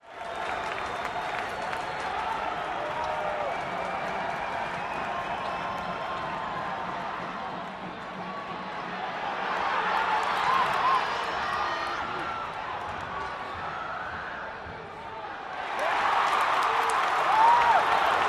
Crowd applauds and cheers at play during college basketball game.; Cheer, Crowd Crowds, Gymnasium Sports, Basketball